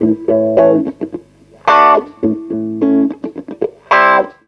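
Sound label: guitar, Roots Funk; USB Soundscan vol.41; AKAI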